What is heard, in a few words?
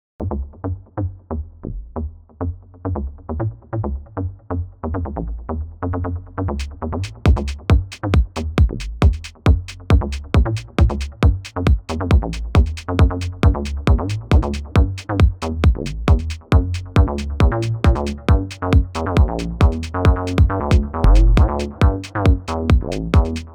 An attempt at acid with DT